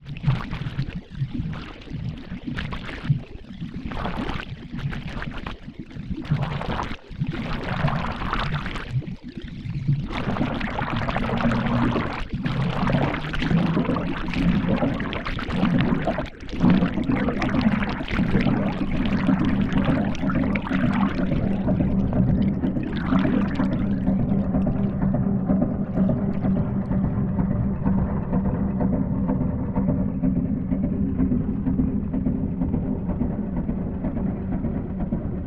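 barge onboard reverse hydrophone left, Barge Bronz diesel 1941; Pole Position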